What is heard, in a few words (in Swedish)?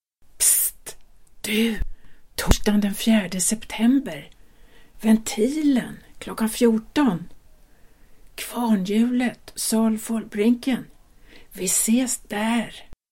Jingel; Ventilen